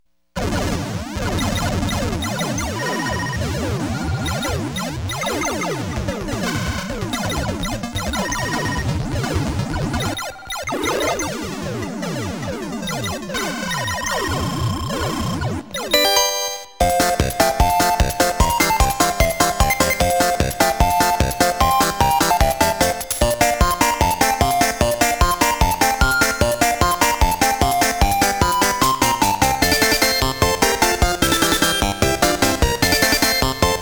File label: PERCUSSION HEAD; SCC Memorial Series; Snatcher; Snatcher Slapping BGM